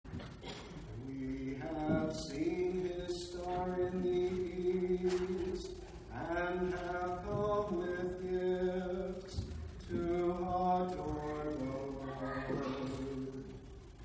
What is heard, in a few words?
Communion Antiphon